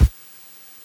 kick, NES DPCM

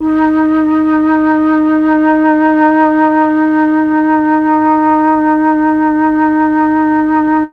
flute; ILIO; Propeller Island Legendary M400

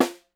SN, Percussion; Miroslav Vitous - Percussion & Harp

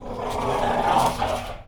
DOG; 3D Sound - 3D Keys VOL-1; MEAN 3D

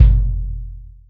ROCK CKIK, ROCK KICK

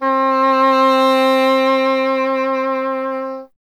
E. Horn

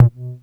polyvox tom, Polivoks; Formanta Radio Plant

